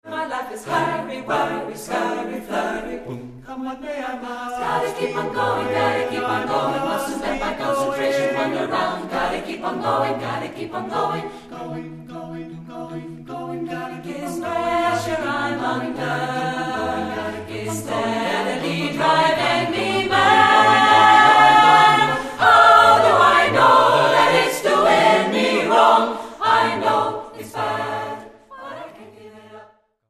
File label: ssatb